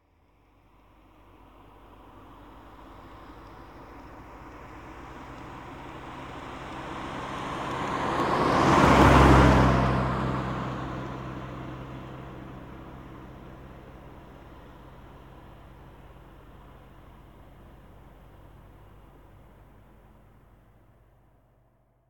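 Mazda Miata MX5 NBFL 1.6 Stock - Exterior - passby 30kmph constant rpm; Soundholder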